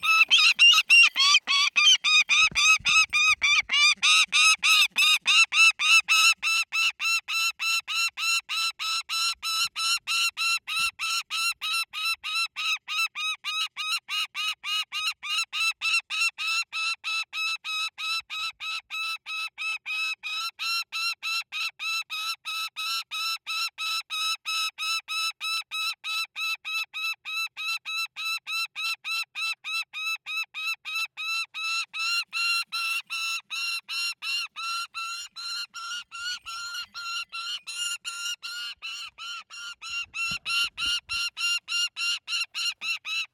Saker Falcon - Call, Squawk Screech Scream Cry, High Nervous; 8060; Soundmind; Predatory Birds